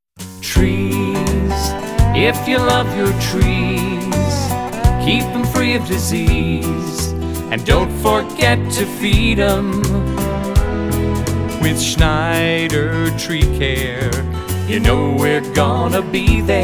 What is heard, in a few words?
full jingle